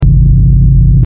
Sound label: ambient hum